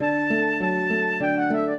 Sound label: minuet, flute harp; Mozart